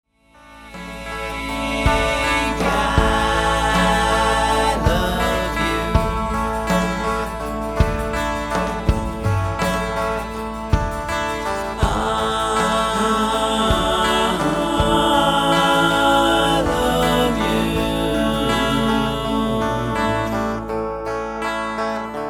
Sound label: music box; mothernature